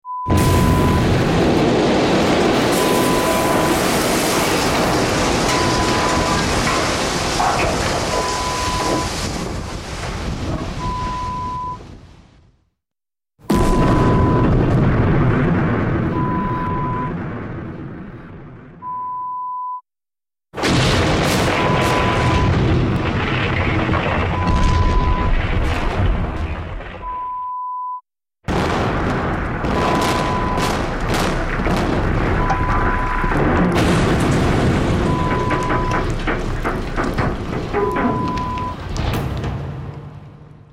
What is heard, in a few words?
Explosion sound Collection